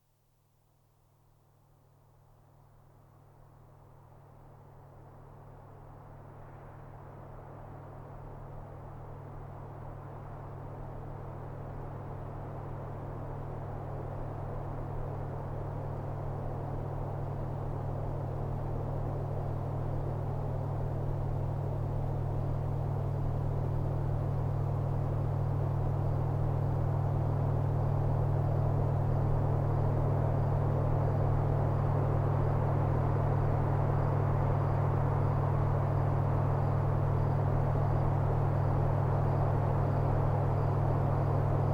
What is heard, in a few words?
Ambience + High Beta Waves; High Focus; Brain Sync